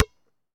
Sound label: Street Ball Bounce Blacktop, Street Basketball; SFX Kit